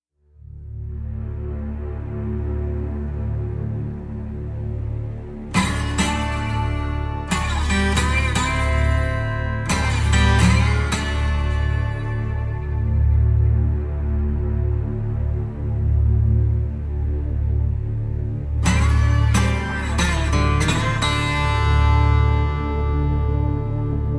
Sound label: bed dustbowl rattle d minor